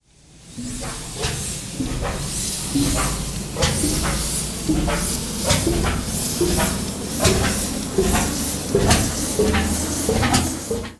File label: Harvester Pull Away; moul-assets